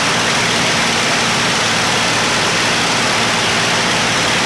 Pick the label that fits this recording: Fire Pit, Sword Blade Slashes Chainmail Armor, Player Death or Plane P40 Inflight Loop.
Plane P40 Inflight Loop